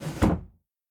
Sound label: Door Slide Close; Devour; The Inn